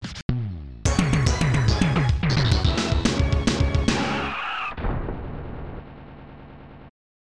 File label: 5th Place Finish or Worse; Exhaust Heat; SNES